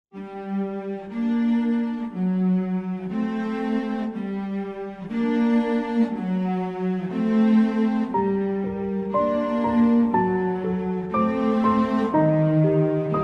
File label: Room Mic